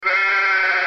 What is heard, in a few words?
ewe